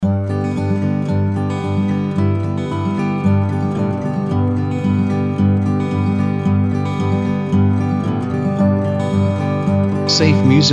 Slow Gentle River

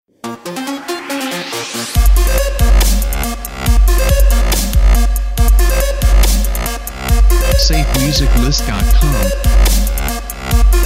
Energetic Trap Intro; SafeMusicList

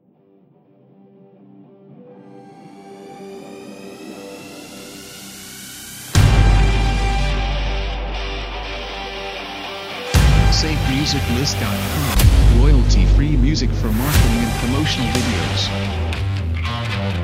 Powerful Force; SafeMusicList

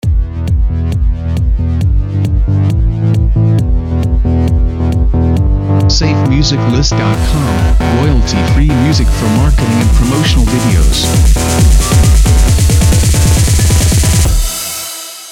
Supercharged Opener

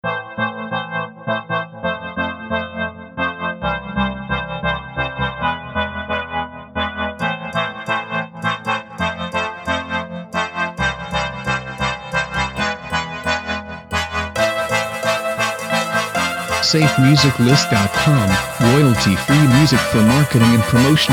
Dancefloor Party Feeling

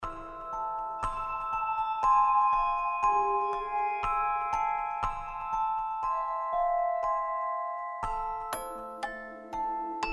Creepy Little Things Loops; SafeMusicList

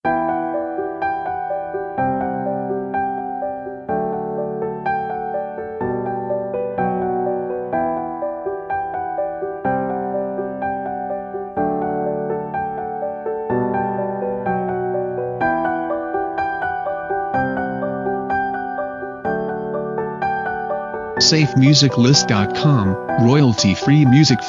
Piano Solo; SafeMusicList

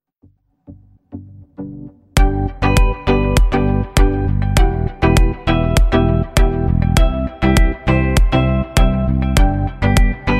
Dance With Me; SafeMusicList

